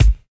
classic smooth hip hop bass drum sample G sharp key